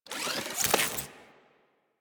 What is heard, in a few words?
Drone Switch Out; Warframe; Deimos; Cephalon Drone